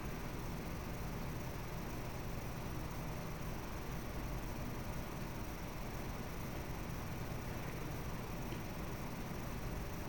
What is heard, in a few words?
ENGTX580 noise idle; ENGTX580DCIIreview